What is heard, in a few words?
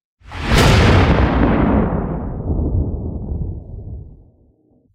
lightning sound